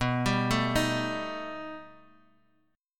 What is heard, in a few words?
B Augmented 7th B down Bass Standard; B+7; arpeggiated; JGuitar